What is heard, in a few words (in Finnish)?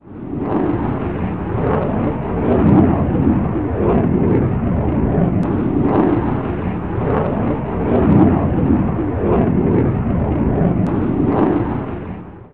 suihkari